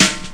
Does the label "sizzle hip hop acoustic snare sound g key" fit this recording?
Yes